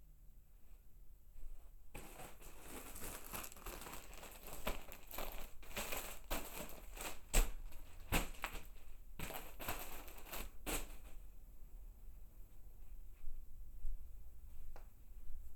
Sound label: Plastic Bag; binaural